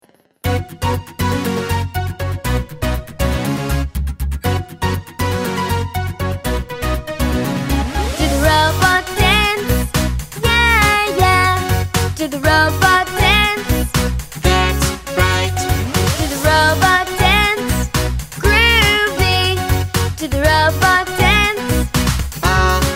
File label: Robot Dance w VOCALS